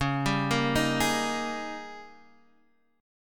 G sharp Major 7th Suspended 2nd Suspended 4th C sharp